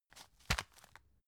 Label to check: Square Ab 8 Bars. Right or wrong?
Wrong